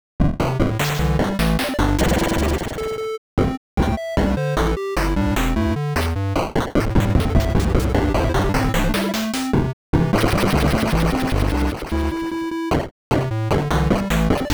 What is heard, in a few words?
beepbox song